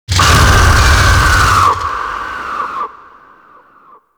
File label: Tau Sentient Vessel Pain; Warframe